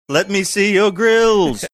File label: singing let me see your grills